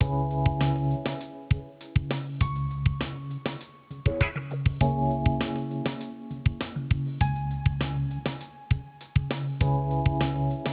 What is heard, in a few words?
queue jazz easybeat 119